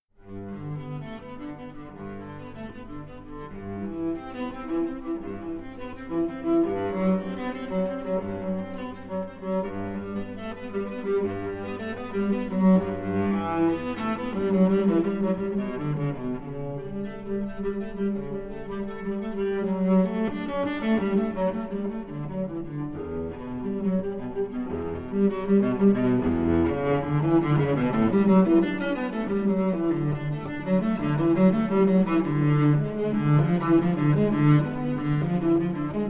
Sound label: Unaccompanied Cello Suite No. 1 in G major, Prélude; BWV 1007 I. Prélude